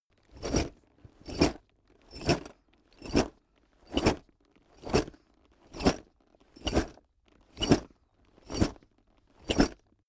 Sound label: backpack metal sequence